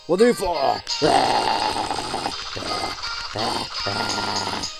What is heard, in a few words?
Blast Beats; Rhythm Game; lolicore